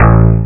A BASS; CDTV Lemmings

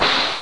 lose ball; Brickles Deluxe v1.1

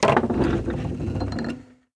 trade ship dock; Immortal

